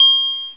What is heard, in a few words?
Bell Chime; Jim Heid's Sounds